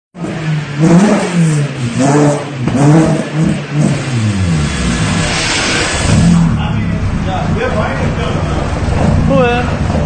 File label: jumper exhaust